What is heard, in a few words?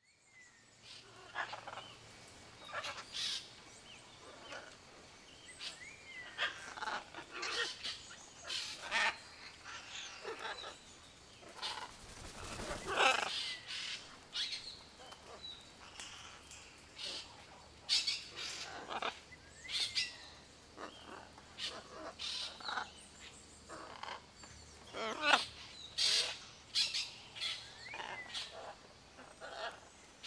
Animal calls close